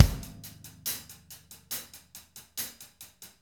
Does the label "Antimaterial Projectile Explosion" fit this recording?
No